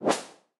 sweep hi; CrossCode; battle

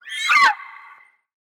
scream; CrossCode; battle; vulturbine

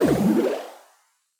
jump panel

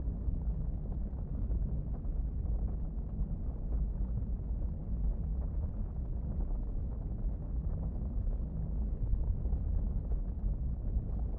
heat ambient, dungeons; CrossCode

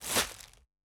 footstep softwet; The Swapper

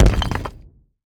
rock burst; CrossCode; battle

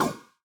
ball bounce cold; CrossCode; battle

